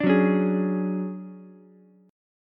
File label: D Major Sharp 11th, D, down, Ukulele C6; strummed